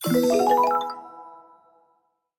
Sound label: correct